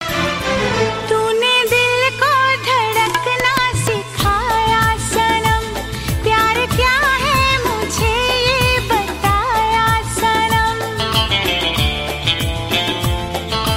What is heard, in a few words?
Old Song